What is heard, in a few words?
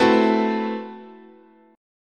A-Minor 6th Add 9th, chord, guitar